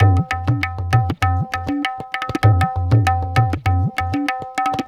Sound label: Tabla